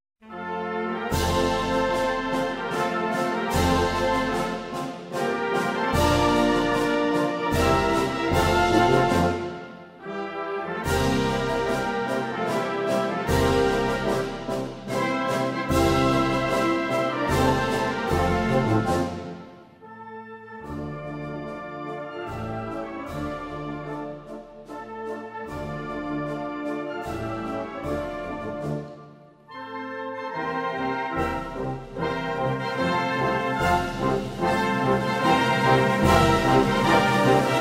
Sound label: Ελληνικός Εθνικός Ύμνος National Anthem of Hellas Greece